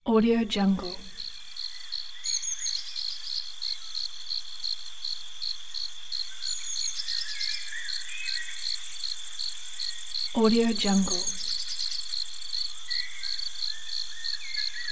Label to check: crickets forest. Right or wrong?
Right